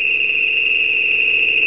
sopwith engin; lem revenge

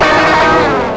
guit

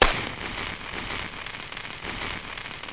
Candles Burn

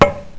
Hit Alien Shot; Asterax

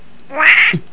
wrong; redsea

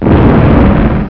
boom; Munchies 1.0.6

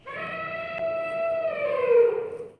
elefant, animals

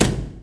forward, menu; PC Action 1998 December

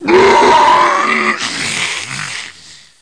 Whacked

